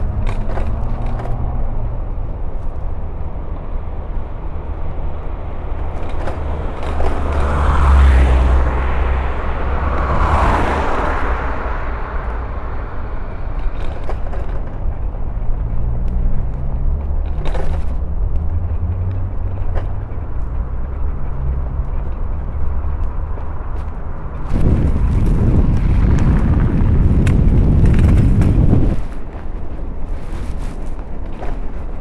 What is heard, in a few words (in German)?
London Bruecke mit Schnee; Walkman Performance